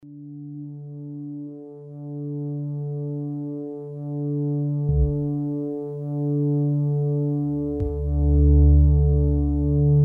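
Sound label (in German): Korg Trident Sägezahn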